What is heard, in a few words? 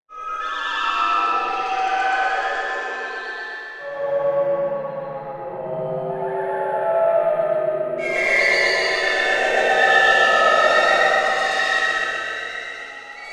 Chaos Keys